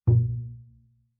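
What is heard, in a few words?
Bass pizzicato fortissimo sul E B2, Double Bass; MIS Pitches - 2014; Strings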